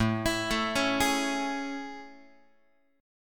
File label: A Major 7th A, Guitar; AM7 arpeggiated; x,5,7,7,5,7; JGuitar